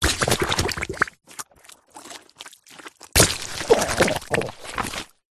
cartoon slime wet splats squelches dribbles; zapsplat

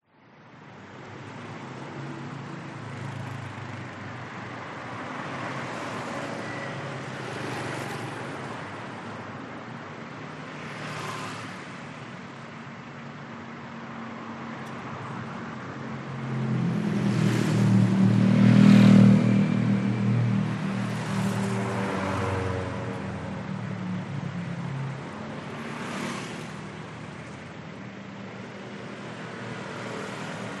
vehicles traffic motorcycles thailand chiangmai

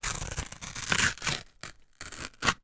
foley card box small rip tear

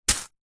leisure hacky sack catch grasp hard

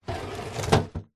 household fridge drawer plastic open

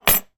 household yale key drop on table

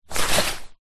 raincoat pick up; foley

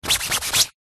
raincoat jacket itch scratch rub; foley; zapsplat

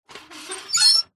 industrial cart metal pull short screech

